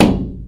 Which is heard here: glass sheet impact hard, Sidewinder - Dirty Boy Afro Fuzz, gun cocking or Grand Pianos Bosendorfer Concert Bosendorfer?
glass sheet impact hard